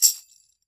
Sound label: TAMB, TAMBOURINES; EdgeSounds; Drum Mashines VOL-1